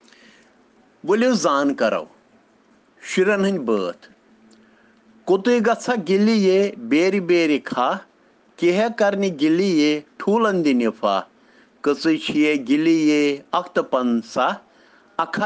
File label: Children Rhyms in Kashmiri